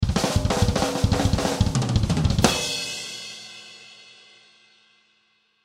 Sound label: Drum Fill Rock, Drum Fill Rock Mini Solo; Drum Kits; Blastwave